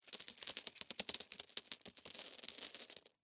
Rocks Set Down, Foley, Handful, Slow, Small, Rocks; BlastWave